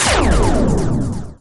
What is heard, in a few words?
TECH WEAPON Gun Shot Phaser Down; GameBurp; 2000 Game SFX